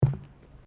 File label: Footsteps Boots Bridge Wooden Step; Footstep Foley; Footsteps (Sound Effects:Human)